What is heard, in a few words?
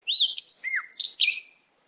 Bird Song